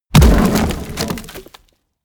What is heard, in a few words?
impact medium; whooshes rises; hits impacts